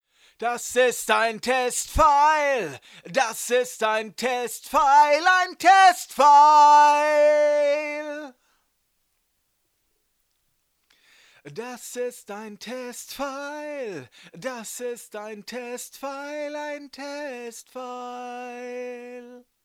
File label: vocals MD421 Preamp